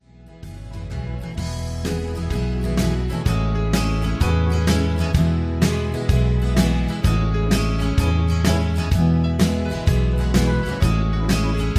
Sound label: instrumental w melody